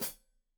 Hat Foot, Hi-Hat, Cymbals Stereo

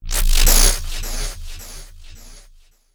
sweep, SCIFI; Techno Trance Essentials

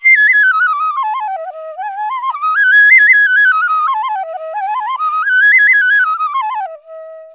INDIA PICC

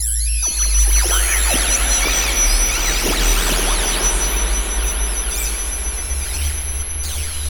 Long Decay, FX

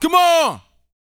VOCAL, R&B Dance, HIP-HOP; Spectrasonics Vocal Planet

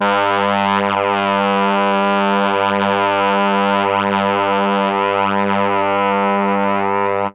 MEL BRASS; ILIO; Propeller Island; Legendary M400

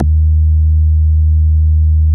SMACK D#2, BASSES

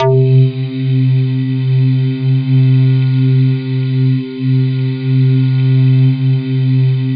BAMBOO VOC, KURZWEIL K2000

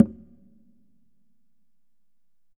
Congas wet, drums percussion

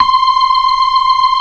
FAST VIB, ORGAN, TREMOLO VIB

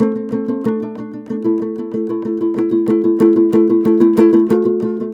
TOPSHUR; Soundscan vol.60; Asian Traditions; AKAI

